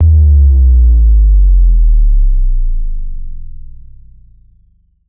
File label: drop